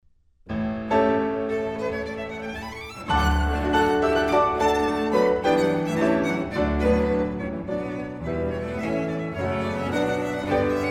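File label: piano trio; cadman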